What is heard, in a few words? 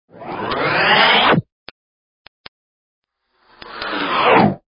In Out, cartoons